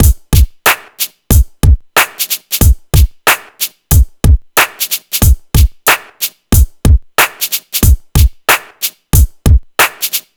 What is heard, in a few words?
E Coast HipHop Drums; East Coast; Digidesign; Pro Tools; Pro Tools Essential Loops